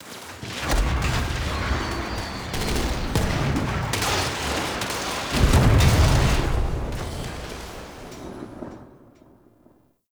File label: ambient battle close; Warframe; Tau